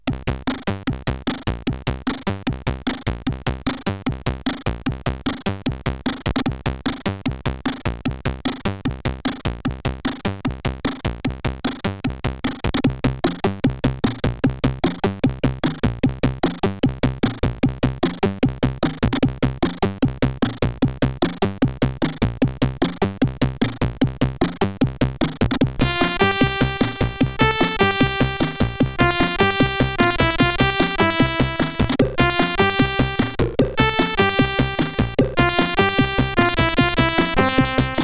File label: Loading Tune, sid, CSG8580R5; Jetpac64